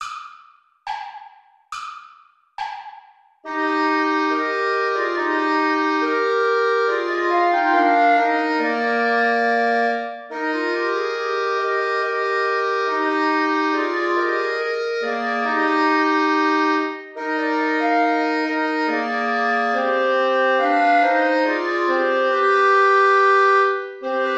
play along without clarinet; haendel georg friedrich; tochter zion freue dich

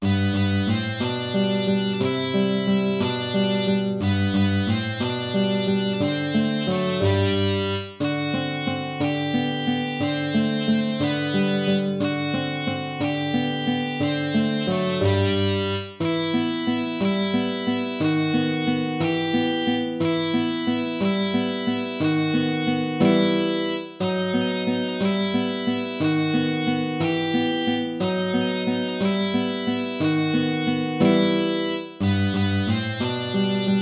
waltz; Carulli Ferdinando

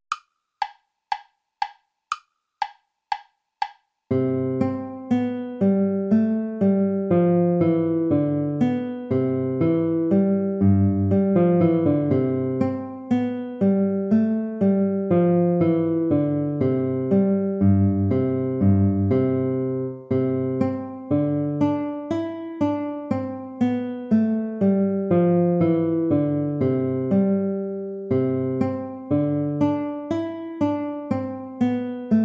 allegro play along

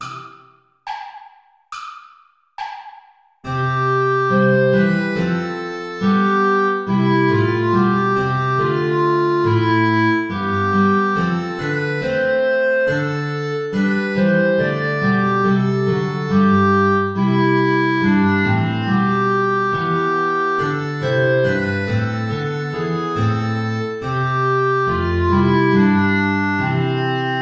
play along without guitar

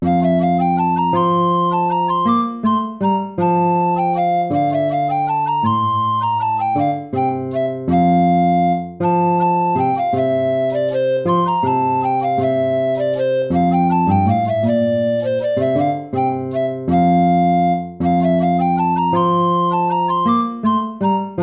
lute

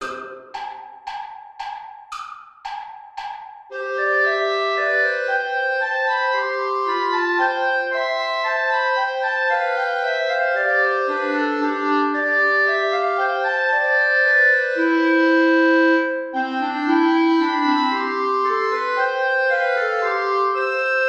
air play along without bassoon; bach johann sebastian

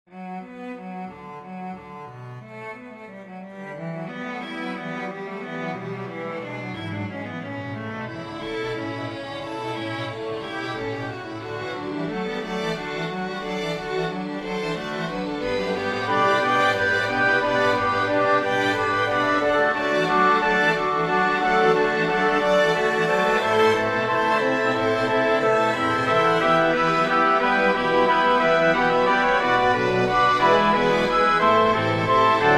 chorus for winds strings; bach johann sebastian; sind blitze sind donner wolken verschwunden

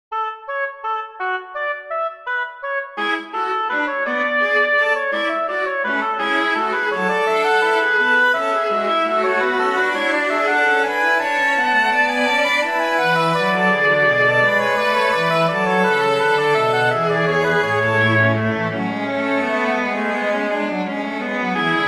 trio for oboe viola cello; bach johann sebastian; allein gott der

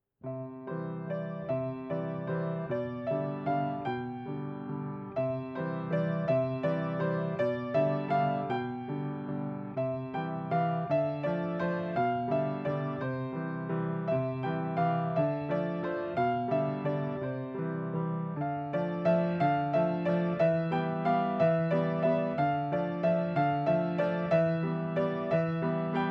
waltz; blue pink

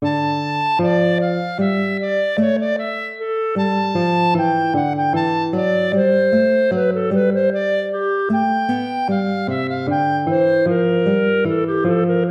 air, hornpipe

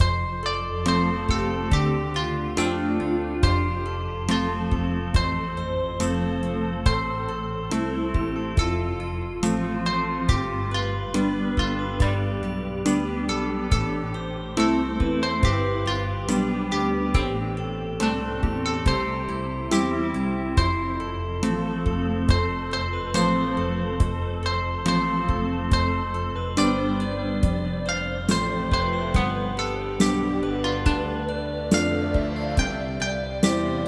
asaku tomu ambient mix; traditional

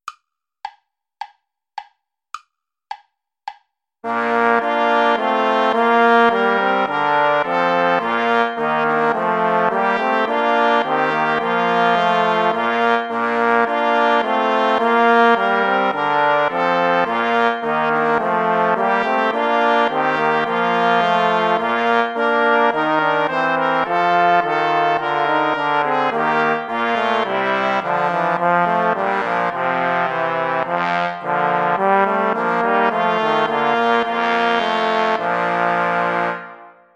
play along without trumpet

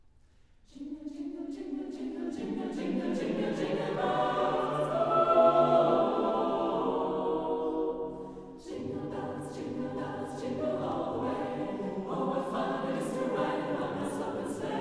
choir; pierpont james; jingle bells

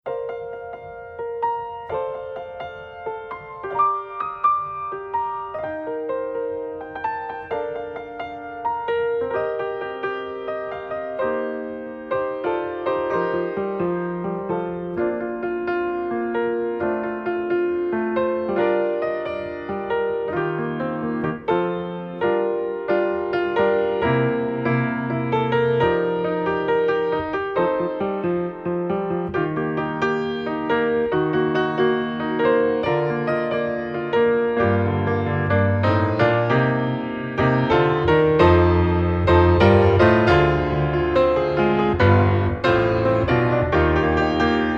for piano hands; ruby korean maples

